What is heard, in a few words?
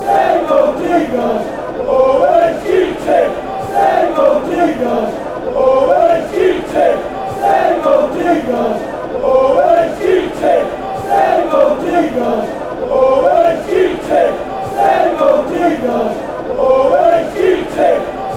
chant, fanchants; chesterfield